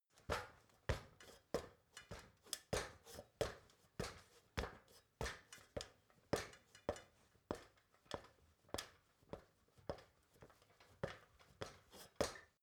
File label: step ladder metal medium